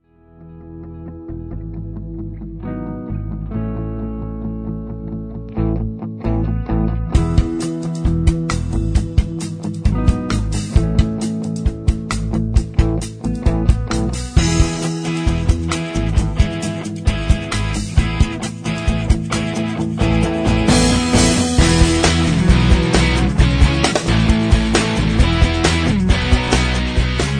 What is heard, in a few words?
Drums Guitar Ring